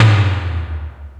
Tom, Yamaha SY22

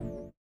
VIBRA; Spectrasonics; Metamorphosis

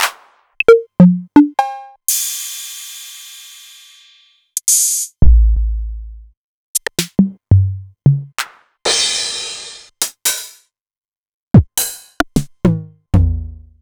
Novation Drumstation; DrumstationV1

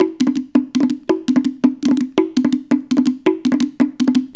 110 Hawaiian, World Dance Beats; Spectrasonics - Supreme Beats

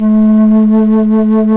FLT, Bass Flute, BsFlt vib

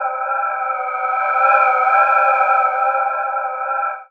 pad c, synth pads; Best Service ProSamples vol.36; Chillout

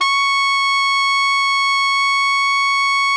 alto FF C#5, saxophones; Greytsounds; Philip Wolfe David Crozier's Saxophones

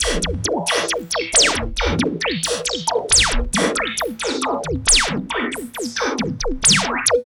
LOOP, Tekno Industrial; East West

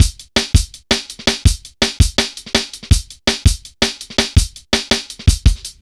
165, Drum Loops, Drum & Bass; Total Drum&Bass

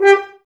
G, Brass, F.Horns, Woodwind Brass Ensemble; Sample CDs; Miroslav Vitous

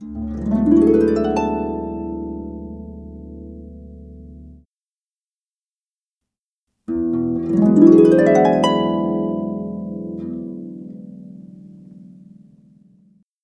Harp, Cinematic; Sonic Foundry (Sony Creative Software); Orchestral Series VOL-3 - Cinematic

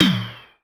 Korg 01W MegaDrums; 300 Drum Machines

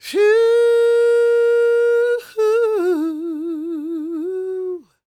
CROON, Vocal Planet Blues & Soul; CW SOUL

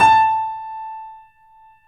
Small Pianos, 3-D Audio Collection

